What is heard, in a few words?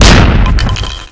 shotgun; HALFE LIFE 3